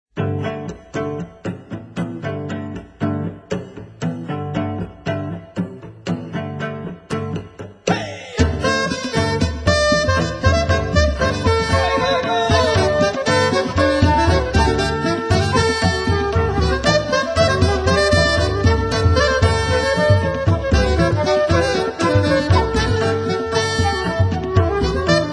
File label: russian dance